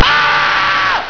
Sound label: AHH